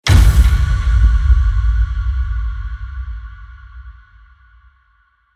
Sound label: Dramatic scare impact suspense hit